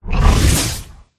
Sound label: riding bug